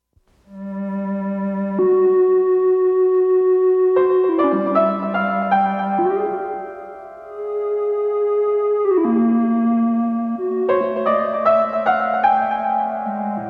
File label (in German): sphärenmelodie; Science Fiction